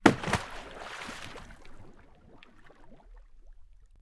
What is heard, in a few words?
Water; TOOLKIT vol.2